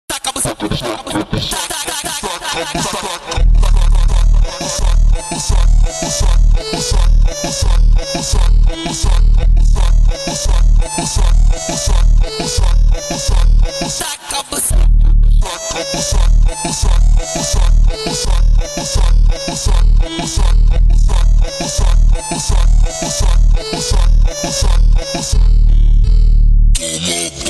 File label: Chicken Funk